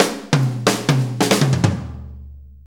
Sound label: 4-4 Fill, Drum Loops